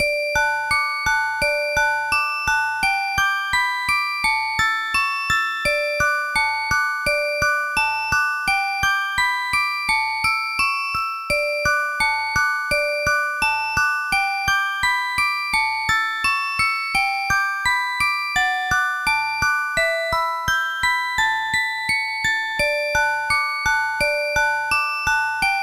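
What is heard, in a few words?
BGM CHARACTER SELECT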